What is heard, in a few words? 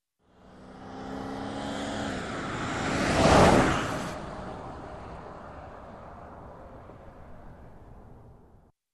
Car passing by fast